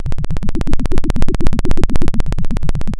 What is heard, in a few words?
Sound of a helicopter